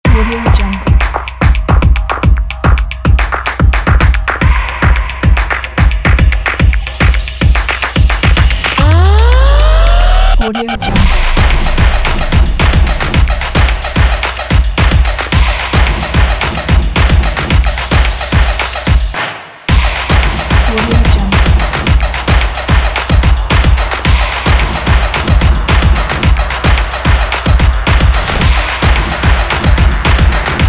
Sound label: the percussive